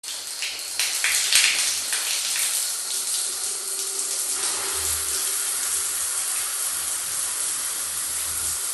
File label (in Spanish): sonido de la ducha efecto sonoro